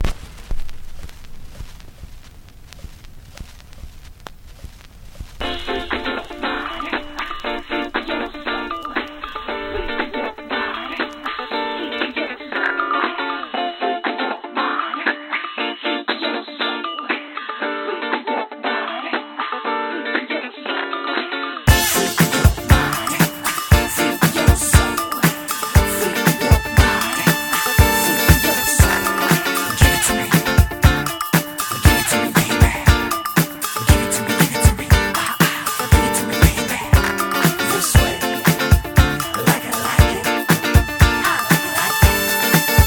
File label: Splash Intro; bigfest; ps vita